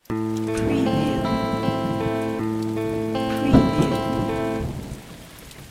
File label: ARP 6-8 Slowrock Am 115bpm Clean, electric guitar